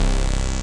SYN C, BUZZ SYNER

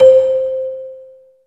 CELESTA, JV-1080; Syntec; Wall of Sounds VOL-2; ORCHESTR-EXP

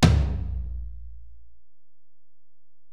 Tom, Alesis DM-PRO, Drum Machines, Toms

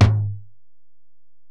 Tom, Alesis DM-PRO

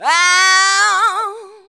VOX, Singin

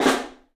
PICSNF, 3D Drums, PICOLO FLAM; 3D Sound

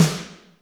Snare, Alesis D4fx

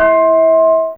Chimes C4, Fairlight CMI IIe, Mallets

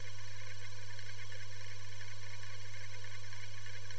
Processed Noise; layering

